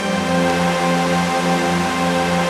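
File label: chord pad, Pads; Best Service; XX Large - Pads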